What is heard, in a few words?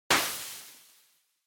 Party Popper Dry Ribbon